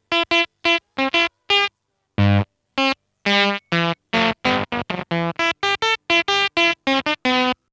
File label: 8bit fuzz